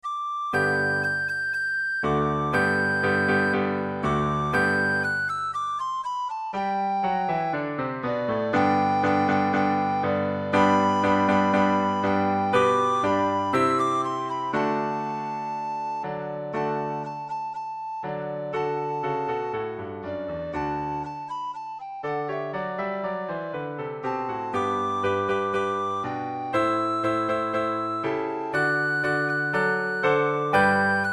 recorder

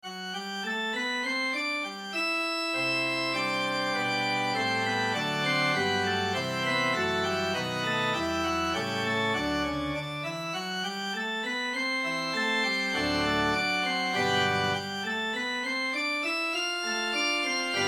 magnificat, organ

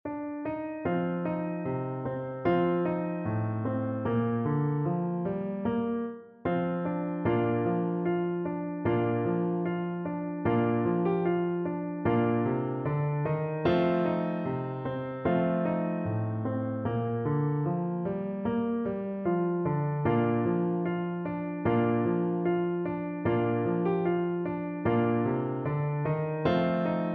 the caissons go rolling along, Trumpet

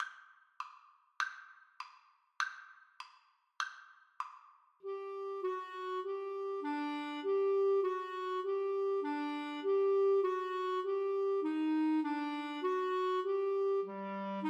Clarinet Duet

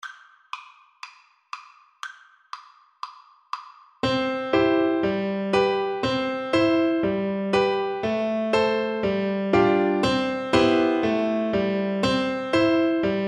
auclair kar, Flute

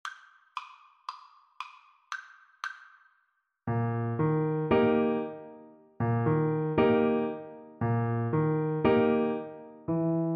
Soprano Saxophone

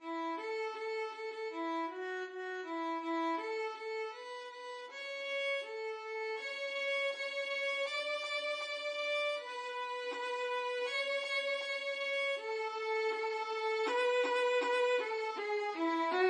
violin; Bingo

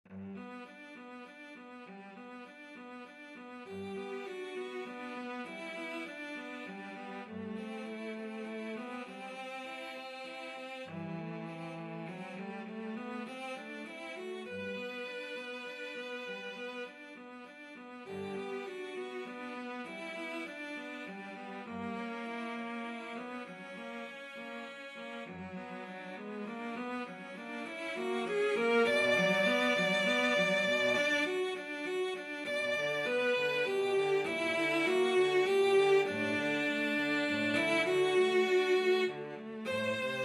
swan cello duet; saint saens

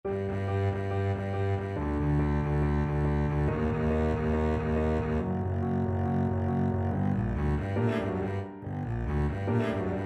mozart sym 25, Double Bass

